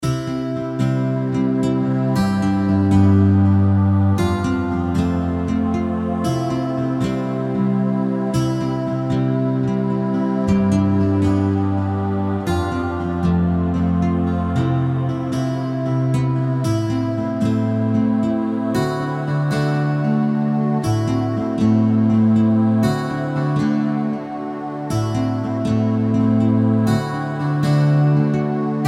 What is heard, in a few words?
Key West Sunset Looping